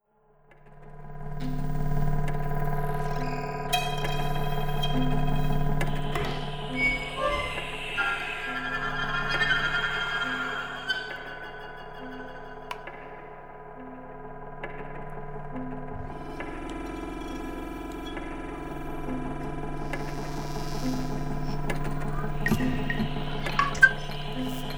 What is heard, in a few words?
Flutervoice